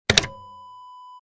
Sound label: typewriter keystroke